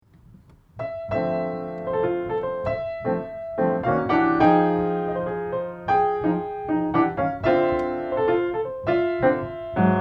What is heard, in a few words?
Solo Piano